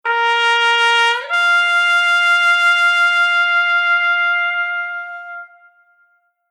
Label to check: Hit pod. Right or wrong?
Wrong